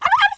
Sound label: desert wolf die